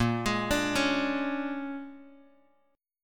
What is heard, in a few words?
Bb 7th Sharp 9th Bb, Bass Standard; Bb7#9; arpeggiated; JGuitar